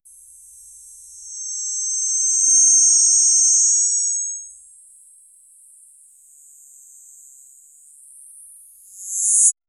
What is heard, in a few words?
16bit snake, snake